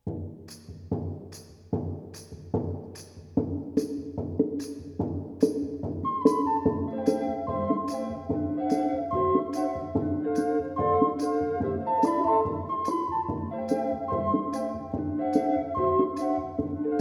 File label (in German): bossa, bambusfloeten